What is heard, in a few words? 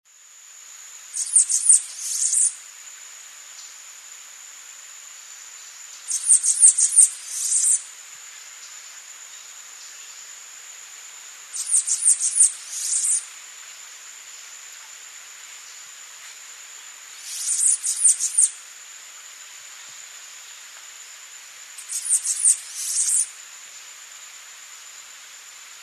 bananaquit, birdsongs, belize